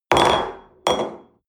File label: espresso upset table hard, restaurant cafe